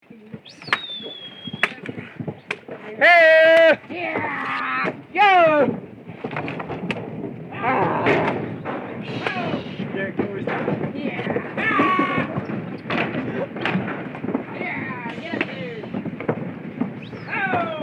Cattle Truck Loading; Cows; Animals; Cow Sound Effects

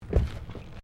Footsteps Stairs Wooden Carpeted Step; Crocs; Footstep Foley; Human

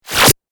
Laser Suck; multimedia

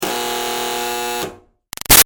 Buzzer Door Junky Med Breaking Up; Alarms; Electronics

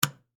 Light Switch Off, Hotel, Room; Foley, Light, Switch, Off, Hotel, Room; Household

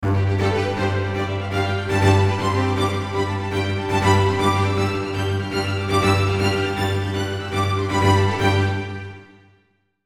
Musical Strings Melody Ascend Descend; Blastwave

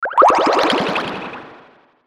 Sweeper Descend Delay Bubbles; Production Element Imaging Element Accent Transition; Descending Sound Effects

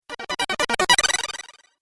Cool Fx Video Game

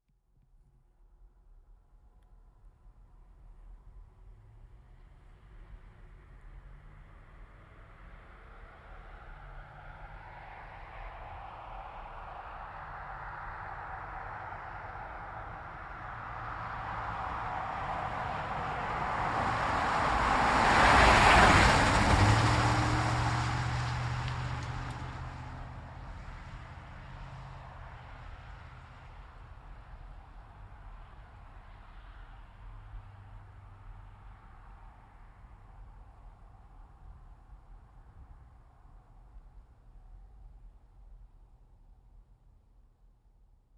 Truck GMC Savana By Corner Med; 2010 GMC Savana; Trucks; Vehicles

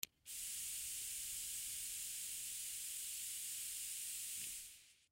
Lighter Gas Release, Lighter Gas Release Butane; Lighters

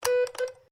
MORSE CODE N; Morse code aplphabete; Morse Code (Sound Effects:Electronics)

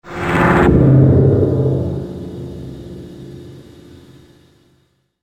Doors Of The Mothership, spacecraft; science fiction sound effect; star wars / trek style games

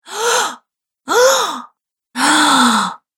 Female Astonished Gasp Collection; Recorded Voice Sound Effects

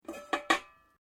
Bowl Metal Pickup; Various kitchen bowls; Materials

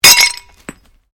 GLASS SHARD SMASH; Material components for constructing more complex sounds

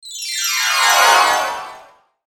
Logo Chimes Descend Whoosh Tonal; Sonic Logo Sound Effects; Production Element Imaging Element Accent Transition

